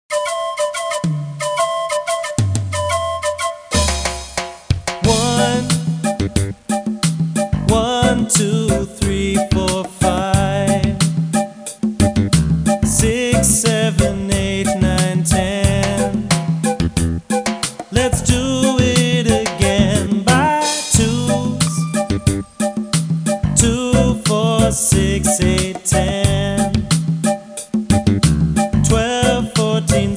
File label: Songs nine Counting; Disc Man; Fisher Price Ready for School Kindergarten